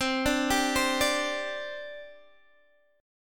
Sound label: C Suspended 2nd, C, down, Guitar, Open D